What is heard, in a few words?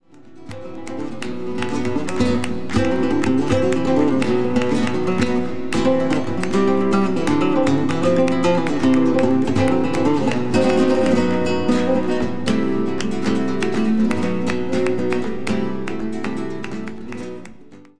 bulerias